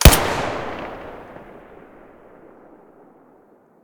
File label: tt33 muzzle stereo